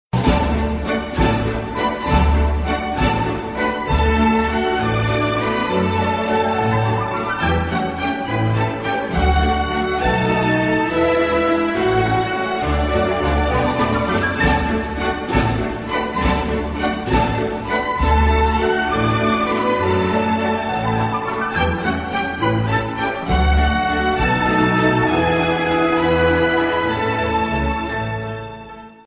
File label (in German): WALZ; kermis geluiden